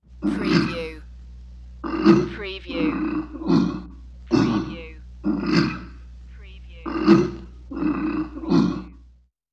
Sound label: Rhinoceros Grunting